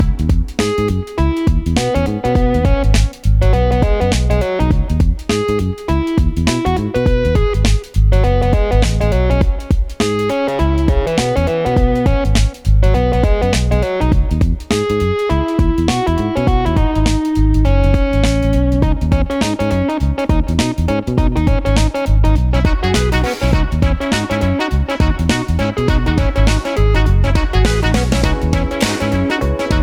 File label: City Loop